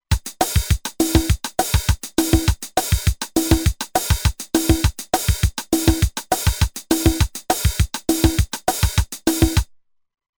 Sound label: Bossanova, Roland TR-505; Factory Rhythms